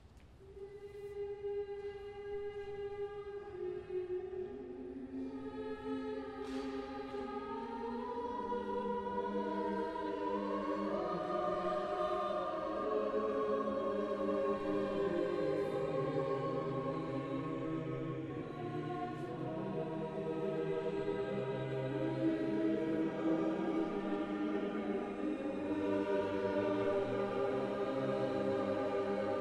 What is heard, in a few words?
Kyrie, Chor; Byrd